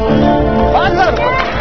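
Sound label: good amount; india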